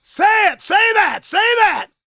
shouts, gospel preacher; Spectrasonics; Vocal Planet Vol.1 Gospel; akai

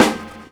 SD HIT; E-Lab; X Static Goldmine 2; akai

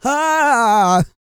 GOSPEL; Spectrasonics; Vocal Planet Vol.1 Gospel; akai

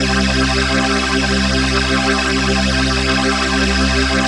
PHASE PAD, ATMO PADS; Best Service; XX Large

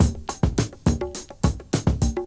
BREAK; ZERO-G; Datafile

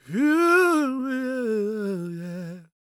GOSP MALE, Vocal Planet Gospel

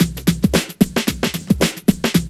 LOOP, DRUM; E-Lab; X Static Goldmine 2; akai

